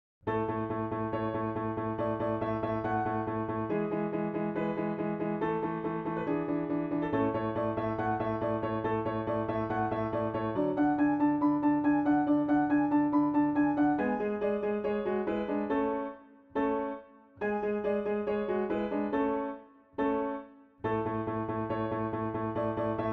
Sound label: Piano; Schubert String Quartet #15 1st movement. Mendelssohn string quartet 1, 1st movement